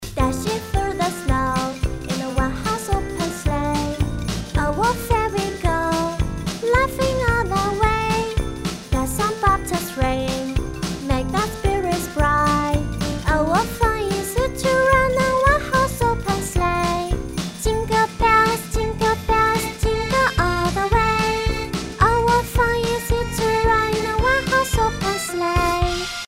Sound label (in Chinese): 歌曲女童活泼可爱儿歌圣诞歌